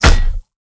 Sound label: hit; Smite; god Kuzenbo